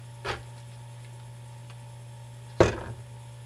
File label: Grabs Bottle